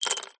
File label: office chalk sticks handfull set down on table